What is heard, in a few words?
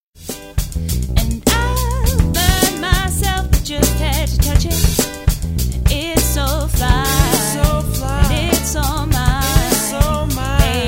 Male Vocals